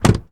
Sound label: Truck Dodge Ram Door Close; Trucks